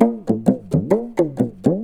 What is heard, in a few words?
PAPAN, Indian Traditions